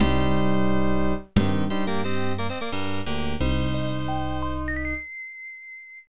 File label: Unused Jingle